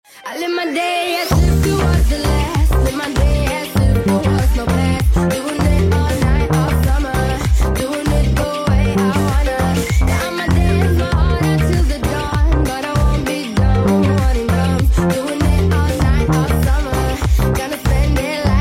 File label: SYNTH BASS